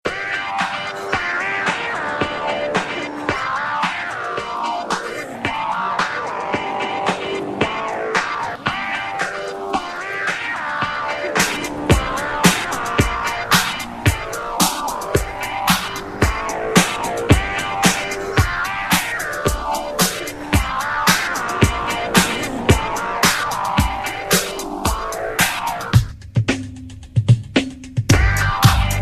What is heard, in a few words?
dunk funk